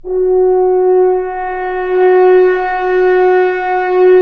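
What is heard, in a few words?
french horns, swells; Roland L-CDX-03 Disk 2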